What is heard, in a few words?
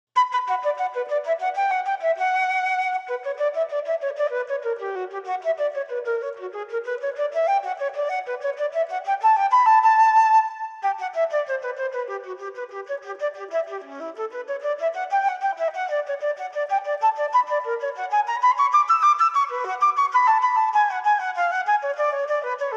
Courante from Cello Suite no 2, unaccompanied Flute; Andy Findon; Johann Sebastian Bach